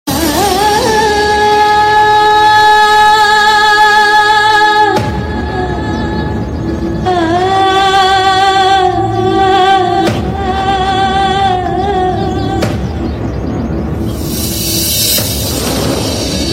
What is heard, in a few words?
Sad BG Tune - Aa Aa Aa; Silsila Pyaar Ka; Kajal